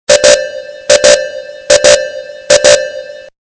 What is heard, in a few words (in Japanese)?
kyujyo yokokusingou